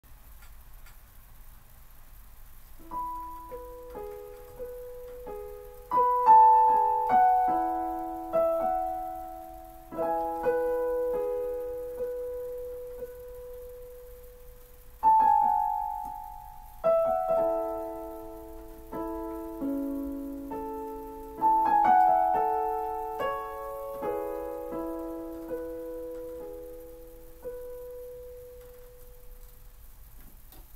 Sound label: Nocturne in E maj w live birds in background; Nightingale